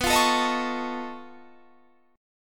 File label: B 9th Flat 5th B down Guitar Standard; B9b5 strummed; JGuitar